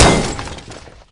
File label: ice Pickaxe; Eustia